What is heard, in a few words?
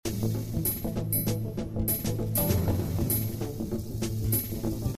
Risky Playfull Funk 98 Am7